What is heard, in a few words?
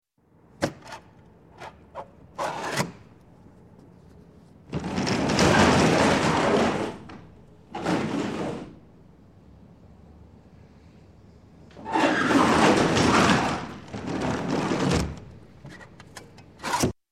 Truck Sliding Rear Door Open and Close; Sound Ideas 2000 Series